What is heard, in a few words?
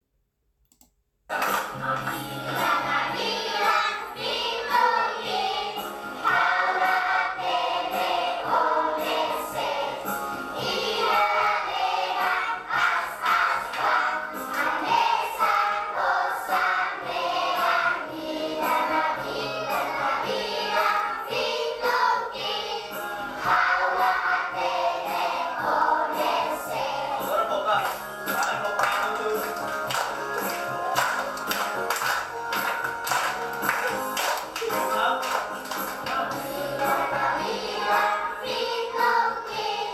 timorese children singing at school